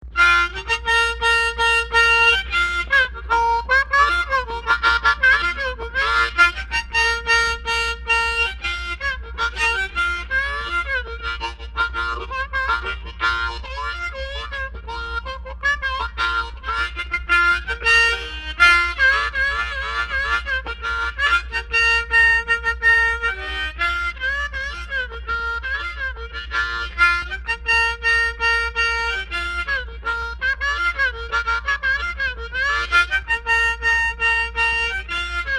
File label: Cajun Tune